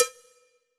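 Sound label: cowbell, drums; Yamaha SY85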